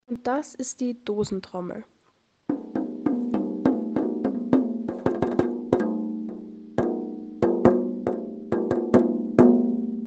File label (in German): Dosentrommel